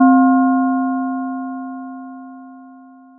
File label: bell